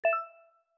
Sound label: Message Ping